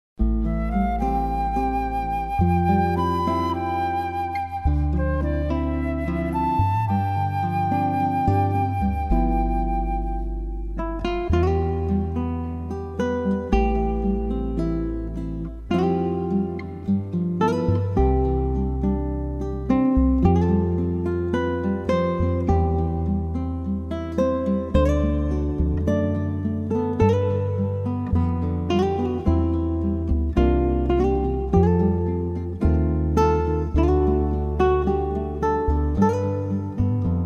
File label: Acoustic Praise Instrumental